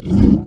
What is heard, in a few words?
smilodon hurt; paleotest